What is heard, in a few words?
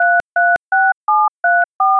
tones 44100 mono aac lc; nero